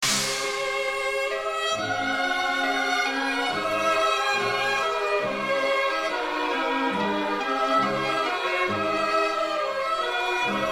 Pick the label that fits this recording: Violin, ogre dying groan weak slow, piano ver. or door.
Violin